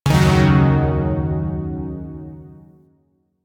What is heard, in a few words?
Yo Chord D, Zariman Shawzin; Music; Warframe